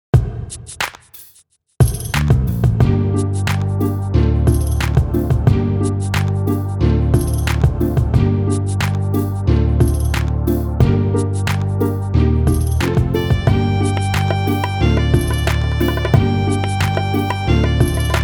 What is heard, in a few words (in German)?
instrumental